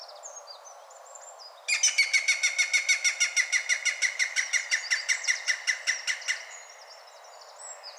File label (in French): pic vert Picus viridis; balade a l'ecoute des oiseaux